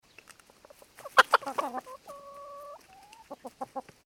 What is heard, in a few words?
animals chicken clucks; Chickens Clucking; Birds